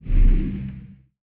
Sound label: etherian portal spawn enemy